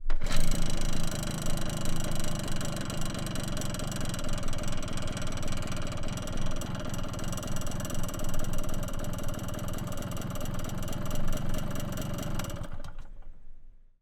Motorcycle - Tvs Heavy Duty Super Xl - Moped - Kick Start - Idle - Turn Off; The AudioVille; Motorcycles of India